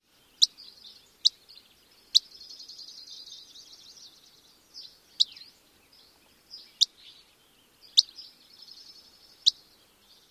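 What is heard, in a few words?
Dusky Flycatcher call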